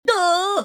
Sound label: unclick